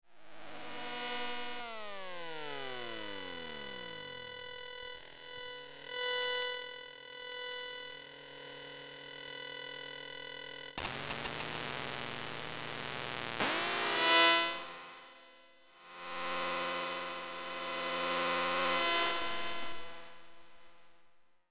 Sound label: Robot vacuum electromagnetic textures